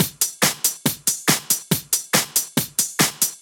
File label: Addon Loop, Drum Loops 140bpm, Perc Loops; Zero-G Trance Inducer; 90 s Sample CDs